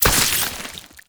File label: Oraxia Brood Spider Web Detach; Warframe